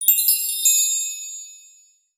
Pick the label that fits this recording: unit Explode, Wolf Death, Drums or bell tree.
bell tree